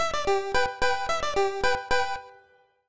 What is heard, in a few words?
Jingle Bonus Present; Tweet Bonus Present; Dandy Dungeon Legend of Brave Yamada